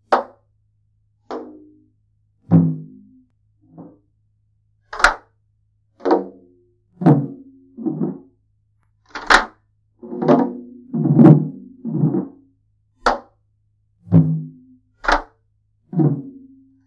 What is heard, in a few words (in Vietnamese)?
Trống Xòe